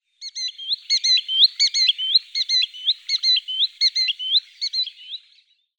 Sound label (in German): Waldwasserlaeufer Tringa ochropus; Vogelstimmen